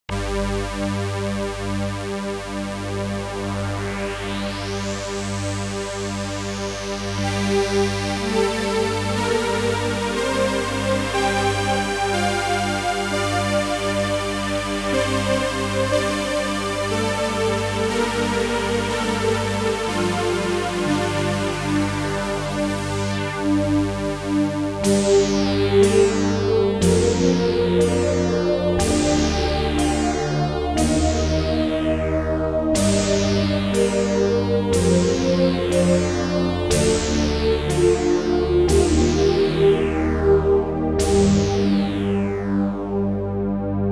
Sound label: atmospheric nord; mario fest